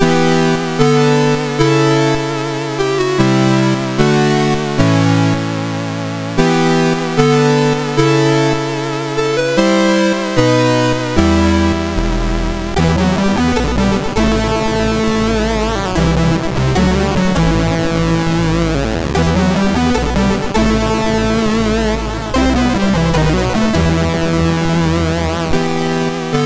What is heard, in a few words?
sid MOS6581R2